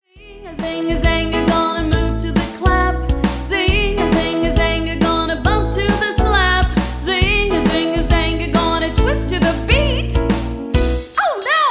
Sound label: Locomotor Feet